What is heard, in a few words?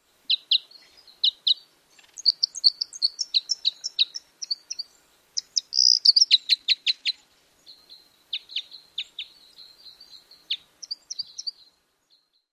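calls and song; WY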